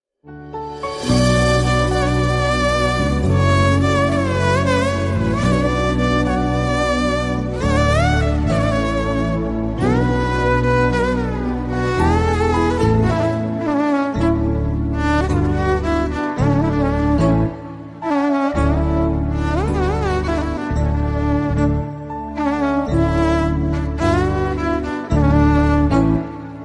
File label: flute music tamil; krishna